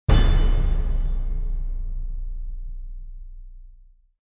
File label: Trailer Hit